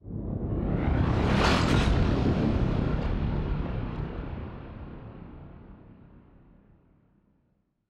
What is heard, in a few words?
ship construction ship move; Stellaris; machineage